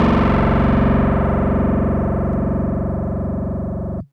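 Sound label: Fuzz Clav DX100 F#0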